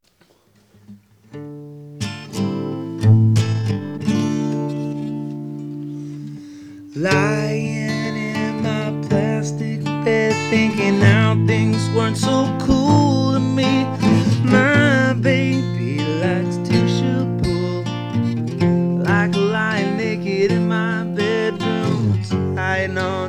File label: acoustic